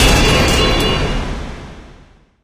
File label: atom impact; Source Engine; Portal 2